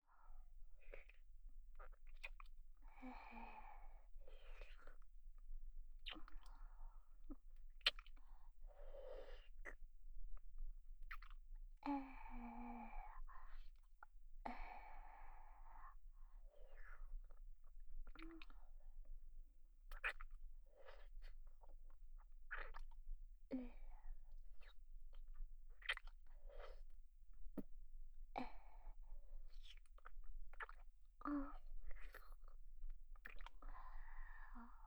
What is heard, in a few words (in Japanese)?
右寄り フェラ 弱め